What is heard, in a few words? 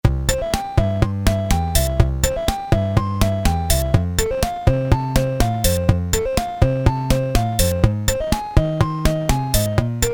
rock, Casio SK-1 Rhythm